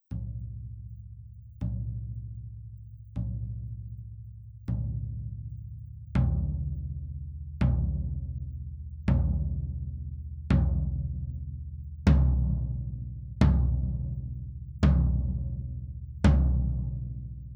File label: Tom Hits; Real SM58